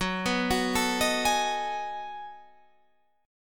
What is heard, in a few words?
B 13th Gb, chord